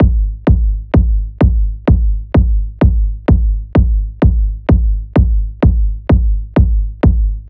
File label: Techno Kicks